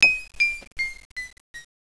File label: elev bell